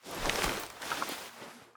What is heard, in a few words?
imp idle; deepimpact